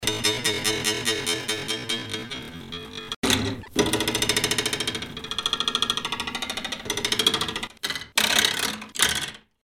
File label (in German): lineal